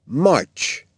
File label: MARCH